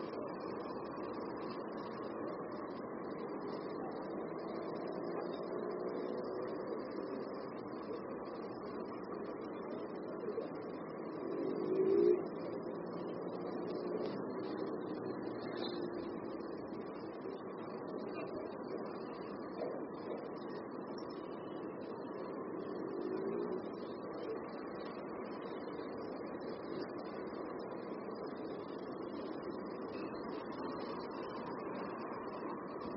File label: Amb Street Day